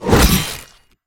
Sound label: bear attack; zoochosis; whale